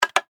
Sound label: Steampunk Mechanical, Scifi, Steampunk, Mechanical, Push, Button; Science, Fiction; BlastWave